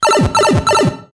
Pipe Maze; SMB3

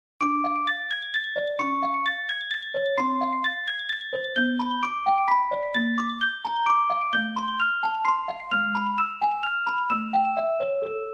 Music Box

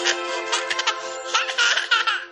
Baby laughter

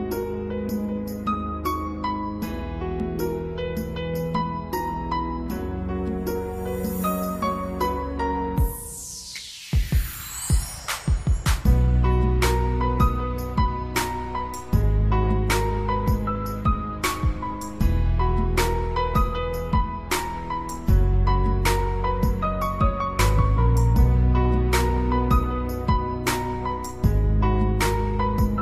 LOVE BEAT

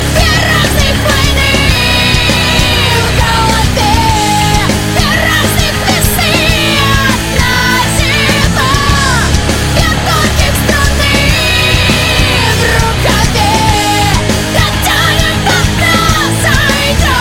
slot car music

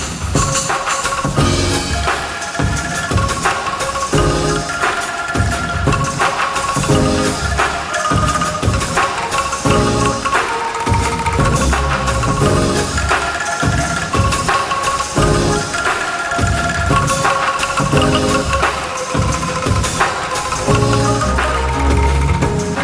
Angklung umbrella

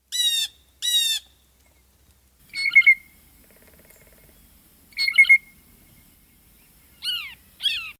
bluejay bird; animals

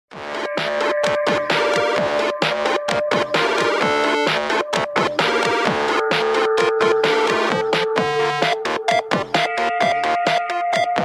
8bit; electronic

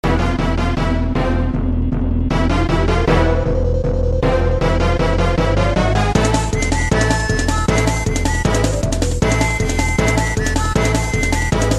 Boss Music

